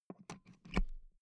insert euro plug hard

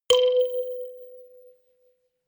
notification kalimba rattle octave high

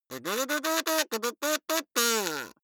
kazoo talk prattle

